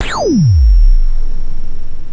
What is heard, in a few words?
laser